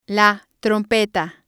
trumpet

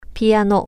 piano

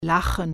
laugh

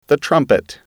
trumpet

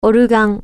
organ

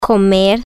eat

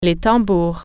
drums

